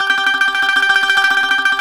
BGL Trem G 3, Mediteranean Traditions

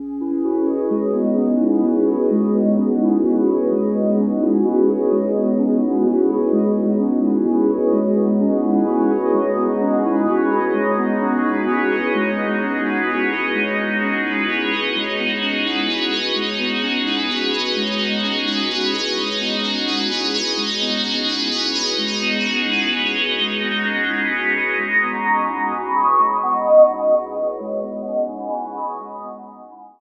Flittery, Synths; Sonic Foundry; Modular Electronica

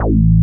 SY BASS, ANALOG BASS; Music Store - Claudius Diemeter Schwerlos

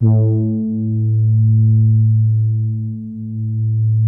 soft brass, poly soft A2; Music Store - Claudius Diemeter Schwerlos

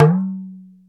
Talking Drum Up; Multisamples; Digital Sound Factory Planet Earth

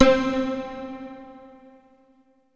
PIZZ C4, SYNTHSTRINGS; Music Store - Claudius Diemeter Schwerlos

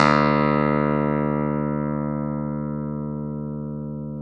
Clav D 3; Keyboards of The 60's and 70's

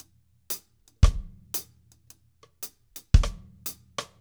REGGAE

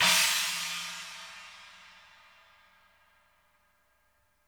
China, Interactive Drum Kits; Sonic Reality

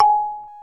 G#4, Kalim M'Bira; NorthStar - Global Instruments VOL-1

